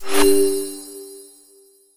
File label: menu close